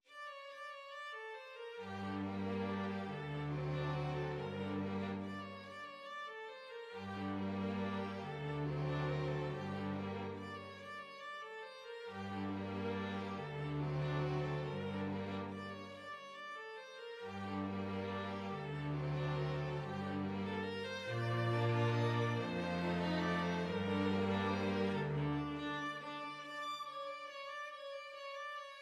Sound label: String Quartet; Fur Elise